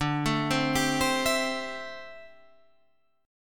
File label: D 7th Suspended 2nd Suspended 4th D, Guitar Standard; D7sus2sus4 arpeggiated